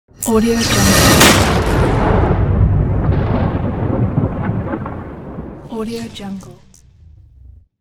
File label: lightning and thunder logo